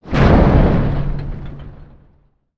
Collision, Explosion

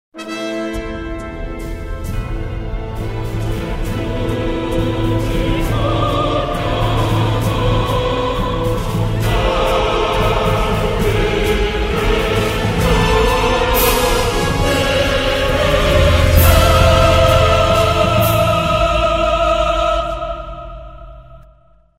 Failure, Klingon

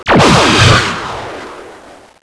Transphasic

